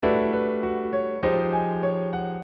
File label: contrasting 5ths and 4ths